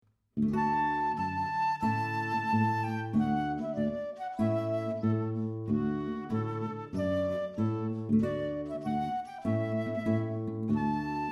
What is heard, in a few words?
Trumpet Tune